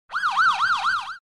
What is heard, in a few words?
police siren short